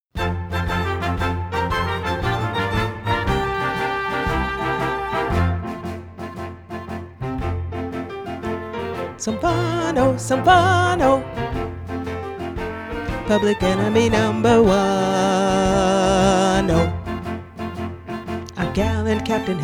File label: bass